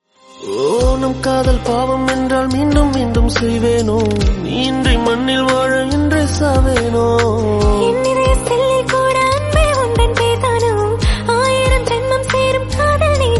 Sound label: New Song Tamil; ringtone